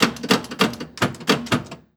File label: Technology Electronic Pinball Machine Retro Machine Buttons Rapid Fire